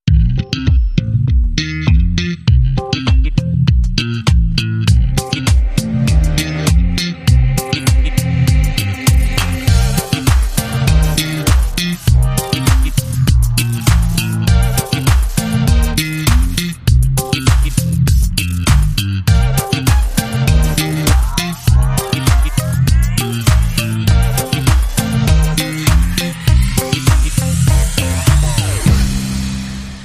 Funky Interference

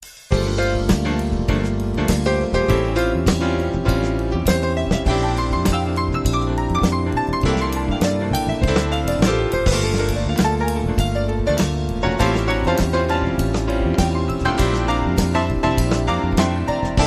Clarinet Samba

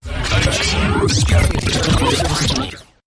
Long Tube Jumble, Taylor Grand

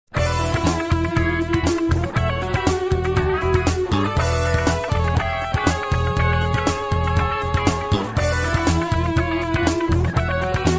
Funky

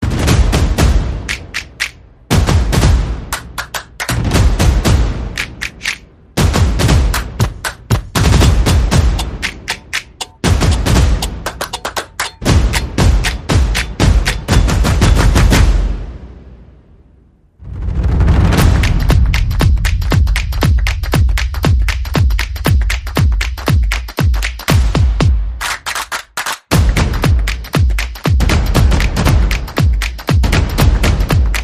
Percussion and Effects

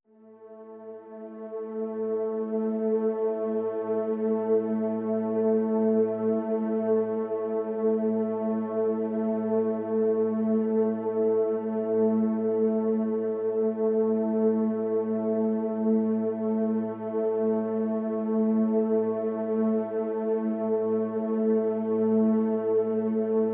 Pads Ethereal Mist (A)

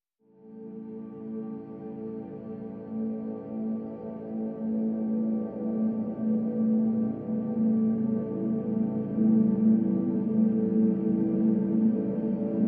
PADS Distant Lights (A)

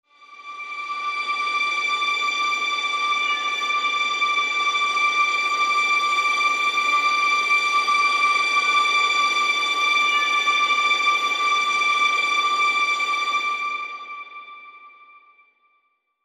Mountain Breeze High Pad

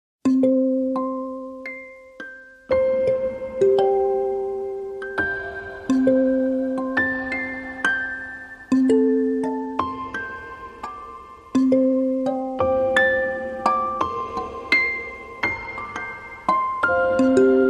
No Bass Buzz

